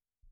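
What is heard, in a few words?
Bass Drum In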